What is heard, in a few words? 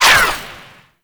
Ranged Weapon Whizzby; Warframe; Necramech; Weapons